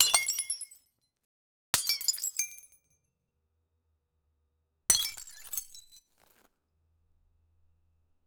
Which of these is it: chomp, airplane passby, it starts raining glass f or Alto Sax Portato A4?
it starts raining glass f